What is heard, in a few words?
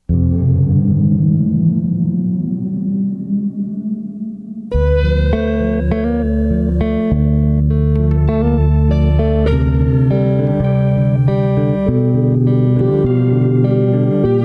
slide guitar